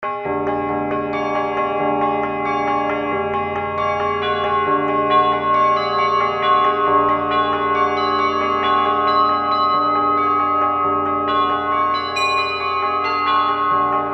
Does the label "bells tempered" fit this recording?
Yes